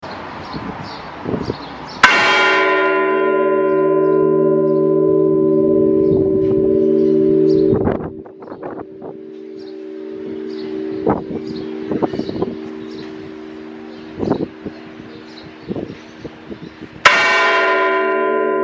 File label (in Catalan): campana